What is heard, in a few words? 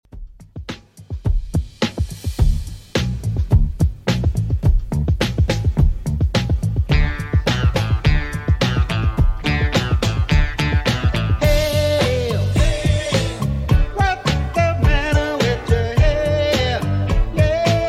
70s 106bpm; Redrum